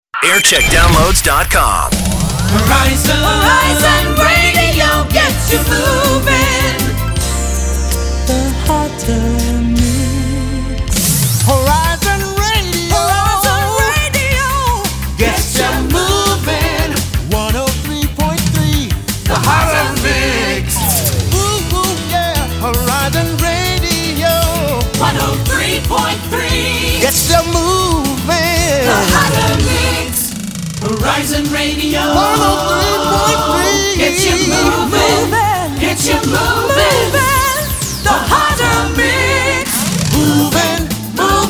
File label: jingle montage; horizon